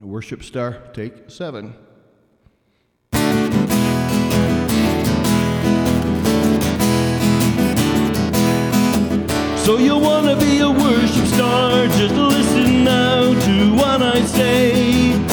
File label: Acoustic; Theological Humor & Satire